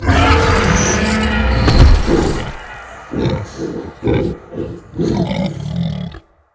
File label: Fight End Success; Bromius; Foley